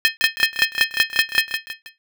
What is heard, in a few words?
stir